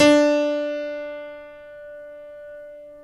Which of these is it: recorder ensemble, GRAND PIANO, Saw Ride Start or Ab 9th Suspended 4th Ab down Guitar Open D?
GRAND PIANO